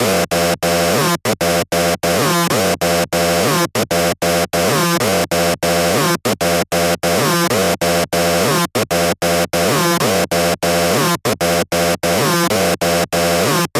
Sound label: synth